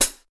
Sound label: loud hi hat g key; drum single hits one shots